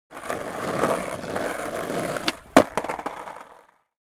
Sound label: Skateboarding falling on the ground